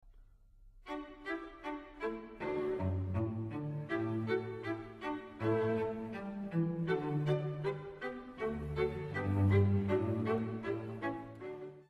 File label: Haydn String Quartet